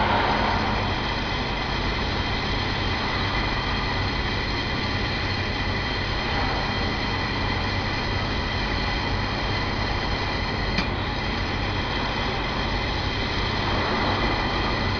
pipe room; cstrike; kz ins bhoptown

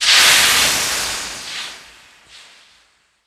steam burst; cstrike

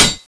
end signal; weapons; janus9; cstrike

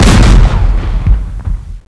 SVDEX, weapons; cstrike

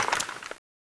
draw; skull4; weapons

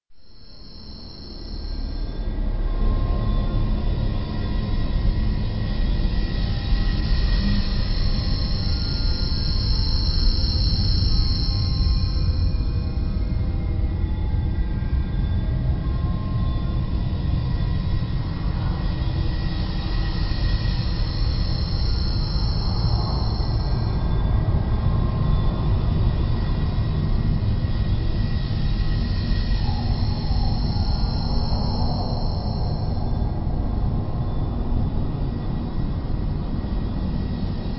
ambience; ins 2ndbhop

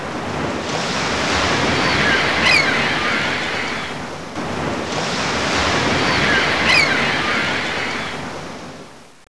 seashore; cstrike; bikinihop

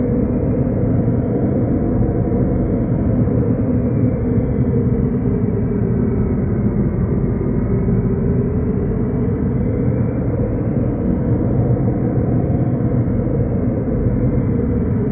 cave wind; cstrike; clair

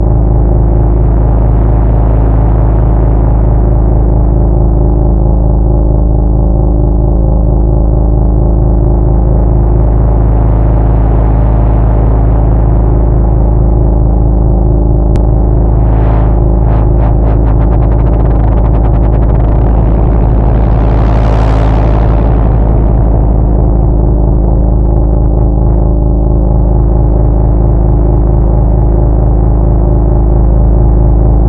loop; kzra wired ez

